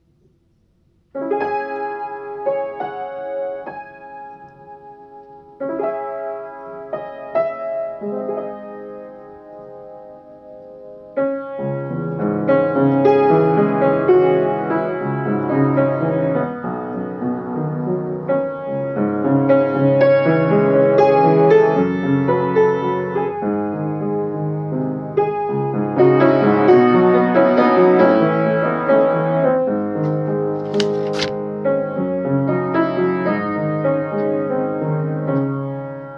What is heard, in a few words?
Serenade in C Sharp Minor, piano solo